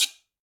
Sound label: Guiro Up; Latin Percussion; Drum Hits; Wood; Ableton; Factory Packs